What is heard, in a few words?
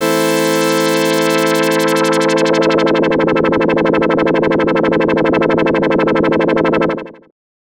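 low-frequency oscillator cutoff frequency ripple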